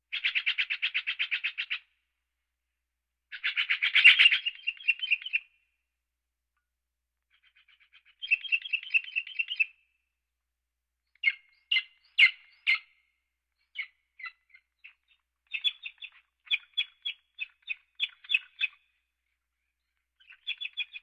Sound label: Birds; Valentino Production Sound Effects Library CD50